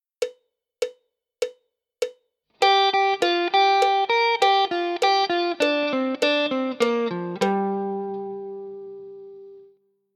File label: G minor pent lick, guitar